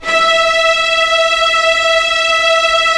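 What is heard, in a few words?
string section; Roland - LA Composer vol. 1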